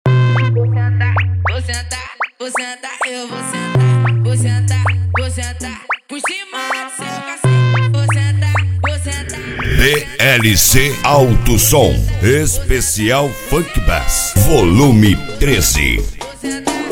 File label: funk bass